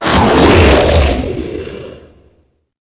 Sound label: baron death; doom4